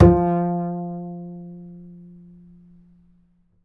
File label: F 4, Bass; Spectrasonics; Bass Legends